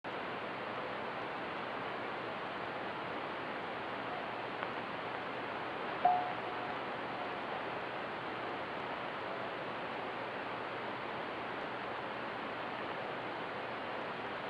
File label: vhf